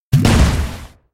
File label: attack; cookie run